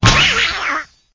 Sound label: Clicker game ducky destroy